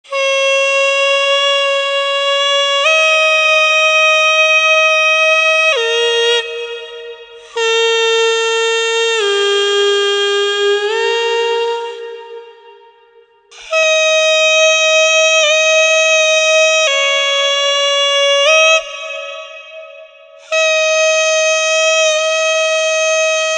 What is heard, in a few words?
Hichiriki solo